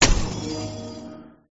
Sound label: ice hit; gardenbloom